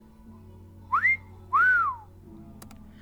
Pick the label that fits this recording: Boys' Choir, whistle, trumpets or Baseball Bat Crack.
whistle